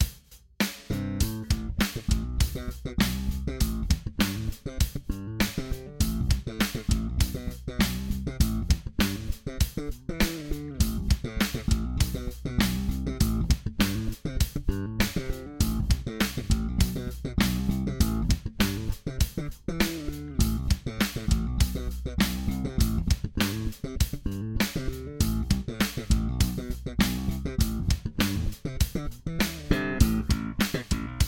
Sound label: 4 bass sp